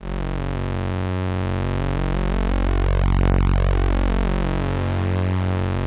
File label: LAMBDA, String Machines